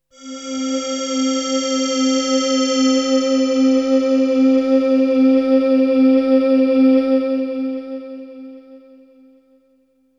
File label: Melody oneshot; ZERO G - Datafile DISC 2